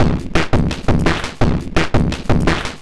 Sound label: Beat Octofuzz 170, distorted drum loops 170bpm; musicradar